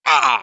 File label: death; cj